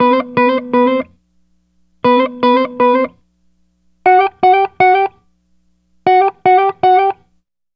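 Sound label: Jazz Guitar Lick, Amin, 119 bpm; Fusion; Pro Tools Essential Loops; Digidesign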